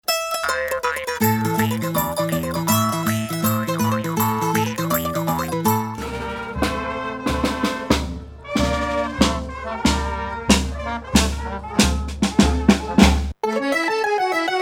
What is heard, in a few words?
Folk Music, Italy